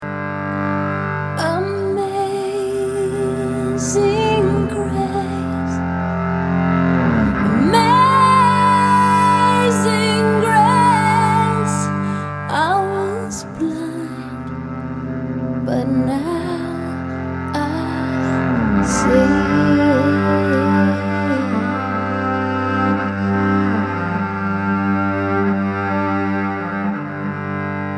amazing grace sample

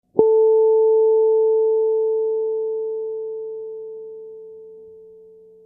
guitar note lp

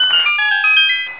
reward

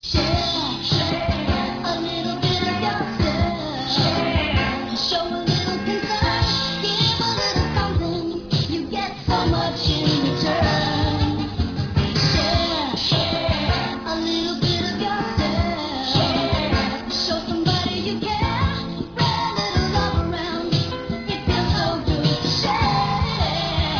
duet

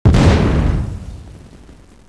blast, explosoes; combates

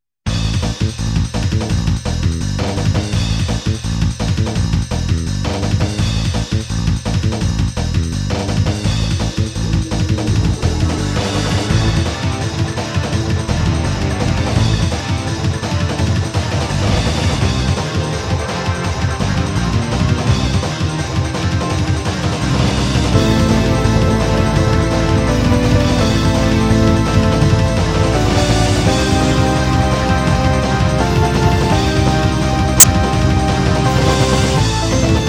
techno remix

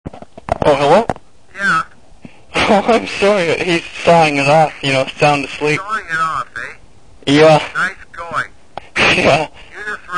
sawing it off

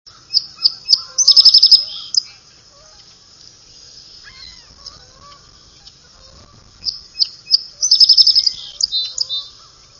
sparrow song, song sparrow; birdsongs

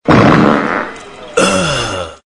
Fart

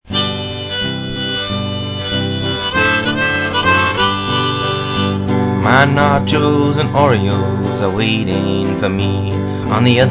Waltz

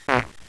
fart

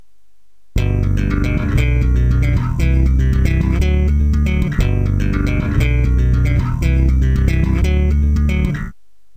folk slap, bass

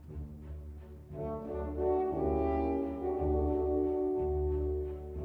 Waltz Flowers

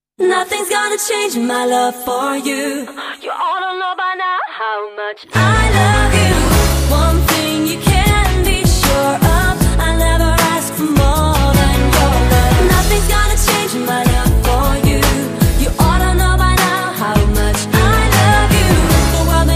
english song

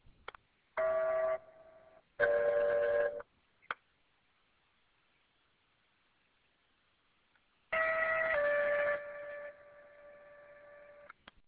17 tones

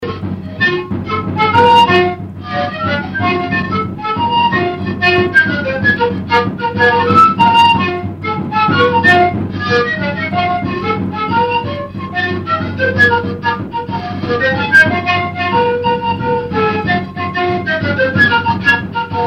siku, ethnic instruments

